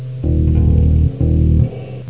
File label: HEART